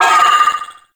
Vocal Pain; Warframe; Sentients; Sentient Trooper

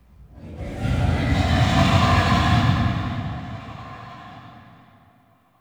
Murex Growl Interior; The New War; Ambience; Cetus; Unum Tower

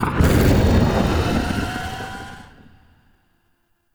Zariman Corruption UI Fracture Open; Warframe; Missions

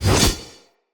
Heavy Whoosh R to L, Swords; Weapons; Player; Mockery Weapon Common

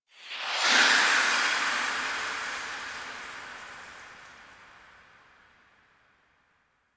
Portal

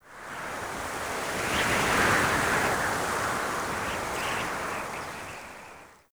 Forest Wind Whistle; Warframe; Grineer Forest

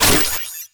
Shotgun Empty Reload; Pax Duviricus

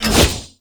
Impale Cast; Warframe; Hoplite

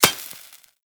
Laser Impact Metal; Warframe

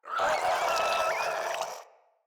Infested Crawler Death, enemies; Crawlers

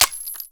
Pistol Reload; Warframe; Tenno; Tenno Bard Pistol

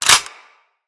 Weapon Jam Slide; Weapons; Grineer

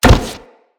Step Metal, Footsteps; Grineer

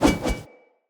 One Handed Axe Spin Whoosh; Weapons; Enemy; Sinecure One Handed Axe

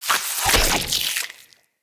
Root Spider Web Shot Cast; Animals; Spiders; Abilities

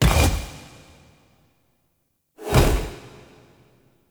Idle Agile Bow; Warframe; Qorvex; Idles

